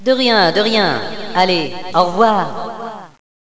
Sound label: kit; Villagina; Village; ECOLE